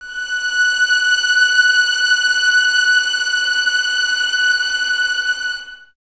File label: VS F#6, 11 Violins, Top; Miroslav Vitous; String Ensembles